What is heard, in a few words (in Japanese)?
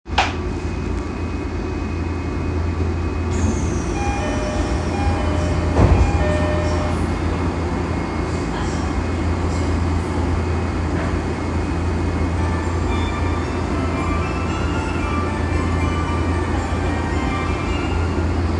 有楽町線護国寺東, train sounds